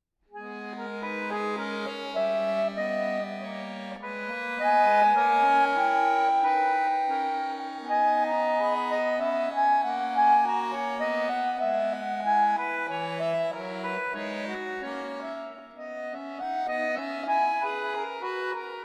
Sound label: Prelude in G sharp minor